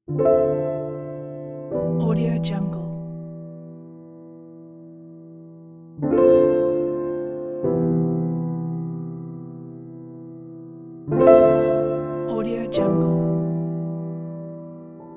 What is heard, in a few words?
lounge piano